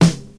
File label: rich lows snare drum sample E key, steel snare drum tuned to the E note; snare drum samples